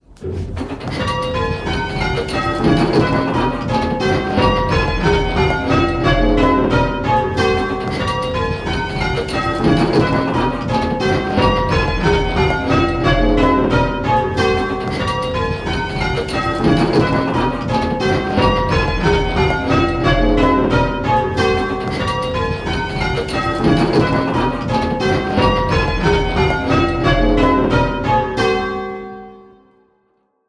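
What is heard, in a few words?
Bells ringing; Pebworth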